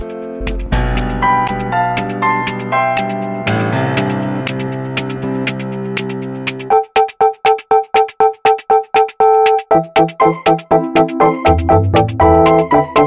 Demented Office Thinking Playful Discovery Mystery